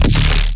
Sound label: weird bass